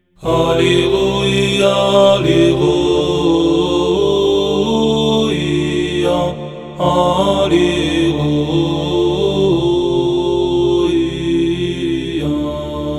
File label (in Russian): alliluya glas 8